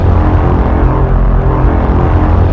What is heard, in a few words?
loop laser; seaborn; zombiesurvival